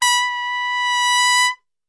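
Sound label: B 2 trumpet; Best Service; Brass Super Section; TRP SWL; 90 s Sample CDs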